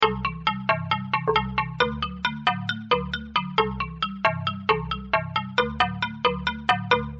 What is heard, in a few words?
Ethnic Xylo